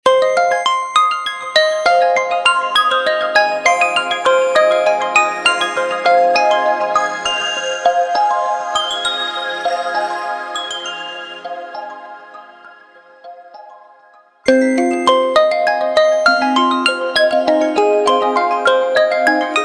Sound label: ringtone; oppo